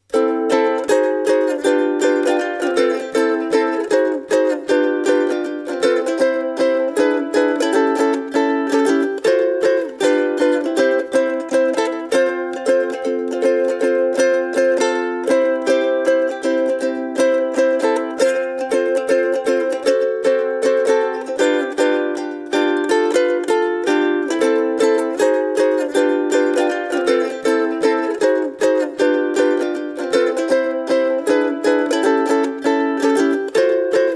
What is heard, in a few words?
Ortega RU 10 solo